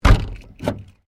CAR SUBARU LIBERTY EXTERIOR KEYS BOOT CLOSE

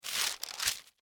Household Fly Paper Down; Cleaning

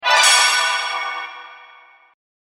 Bard The Magic Lyre; Magic Sound Effects; fantasy sound effect; role playing games, magic videogames

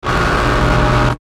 static low hum; Static Low Hum Feedback; Production Element Imaging Element Accent Transition; Blastwave; Static Sound Effects

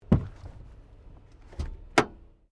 WRECK CAR SMALL HATCH OPEN; Wrecked Cars, impacts, crashes, scrapes; Objects

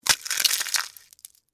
Crunch Debris, Sugar, Cone, Waffle; Texture; BlastWave

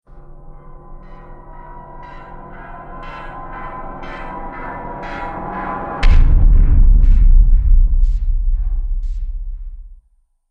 Sinister Horror Impact; miscellaneous impact

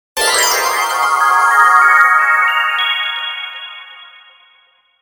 Bell Ascend Delay Melody; Production Element Imaging Element Accent Transition; 5.1 STEREO MIX; Blastwave

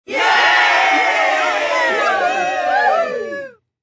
Yay Cheer Group, Exclamation

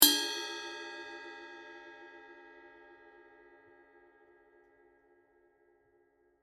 Cymbal Suspended; Musical

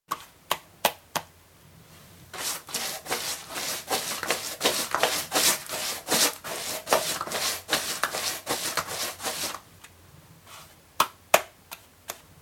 Painting, brush; Machinery; Machines; BBC

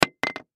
Test Tube Set Down, Test Tube Set Down Fast; Surgeon Surgery; Hospital; Emergency

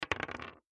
Dice Roll Table, Dice Roll Table Board Game; Casinos

